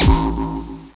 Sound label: collide; cylindrx